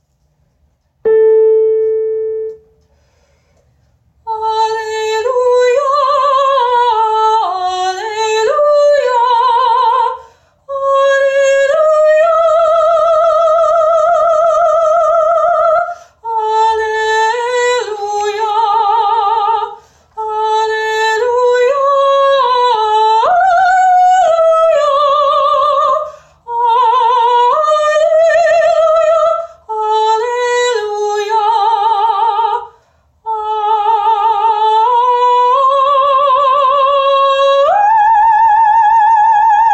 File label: alleluia soprano higher